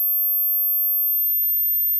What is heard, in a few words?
Text Notification